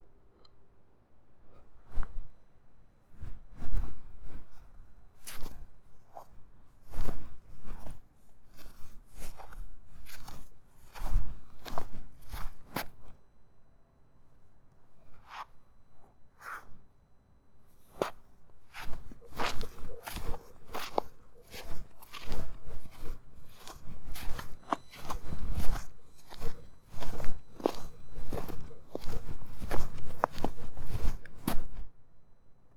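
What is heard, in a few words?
Steps in snow, exterior; Footsteps in snow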